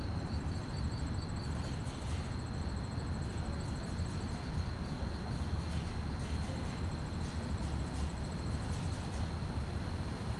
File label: quiet street night fast chirp crickets distant activities slight wind; fvtt sonniss gdc audio